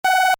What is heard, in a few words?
Computer Beep, Short; Multimedia; Stuart Duffield